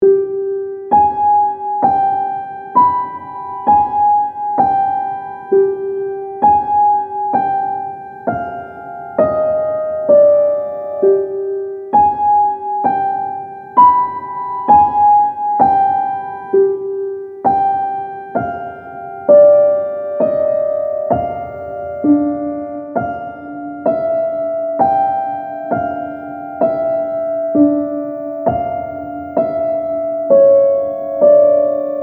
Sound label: Loop Pf2, bell; ループ素材３曲セット 2